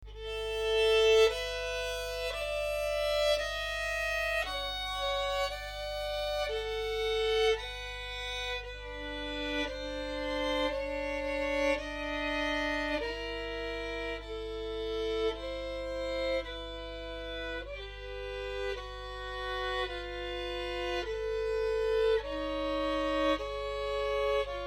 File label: viggiano no slur, violin